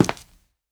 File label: Step